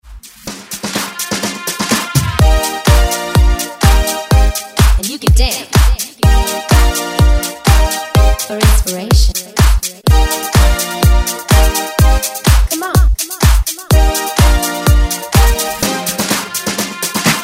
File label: Dance Ext Redrum